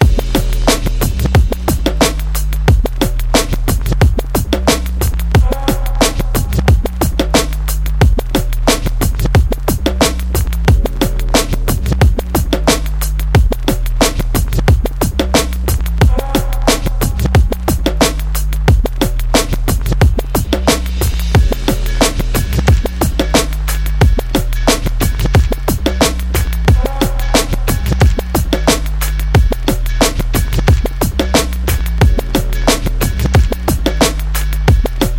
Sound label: Trip Hop Loop; MIUI12